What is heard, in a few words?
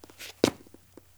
zombie footstep; Project Zomboid v41.73